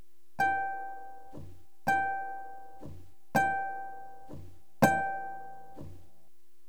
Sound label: sharpness